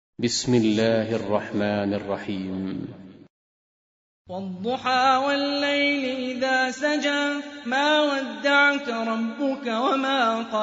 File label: Quran; Hani Refaey